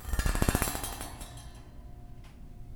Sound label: Rhythmic Metal; BB Retail Therapy Sample Pack